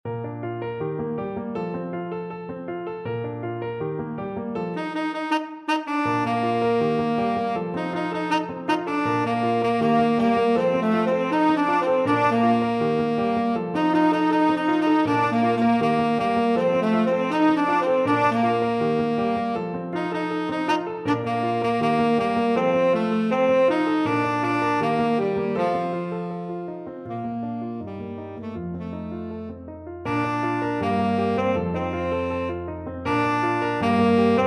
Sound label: tenor saxophone